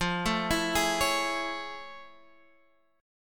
A Major 7th F; AM7/F Chord {X 8 7 9 9 9} arpeggiated

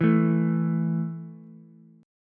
A 5th A down Ukulele, A5 Chord; strummed; JGuitar

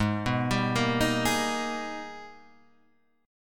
G sharp Minor Major 9th; G#mM9 Chord {4 2 5 3 4 4} arpeggiated

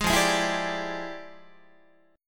F Minor Major 13th, Guitar; strummed; JGuitar

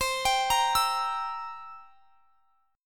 C 7th C down, C7; arpeggiated

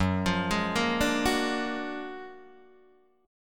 Gb Augmented Major 9th, down, Guitar, Open D

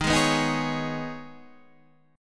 D sharp 7th Suspended 4th Sharp 5th

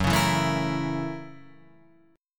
F sharp 7th Sharp 9th

